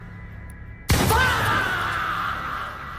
shotgun

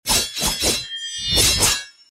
knife combo hit

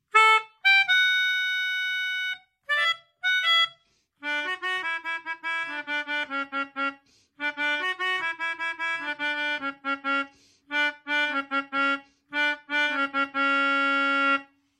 melodica

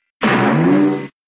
cartoon bumped

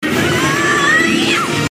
groan; ginormica

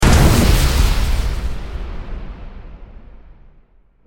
big explosion water

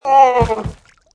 goat death

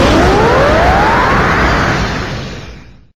power up sound; dbz

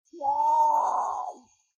potoo maawwwm

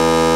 buzzer button

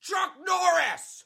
yell; chuck norris

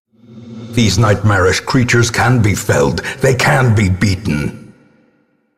battle win; nightmarish creatures

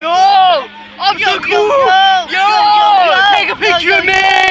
screaming

